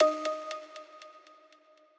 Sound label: Lead Hit; Remixlive; Dancefloor Pop